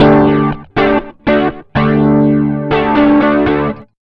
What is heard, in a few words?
rock, acoustic guitar loops